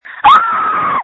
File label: Scream